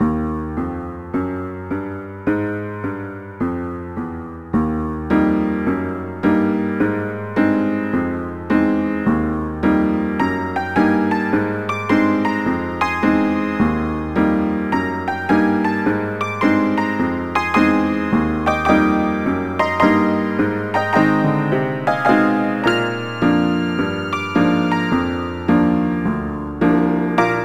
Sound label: Dance Jive 1 Slow; Knigge Spielend zum guten Benehmen; NDS